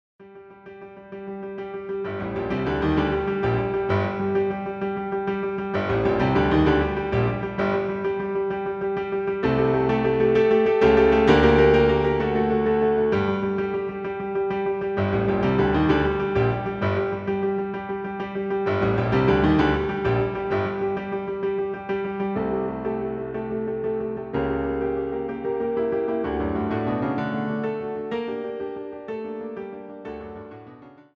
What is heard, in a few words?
piano transcription; Erlkonig; Schubert Liszt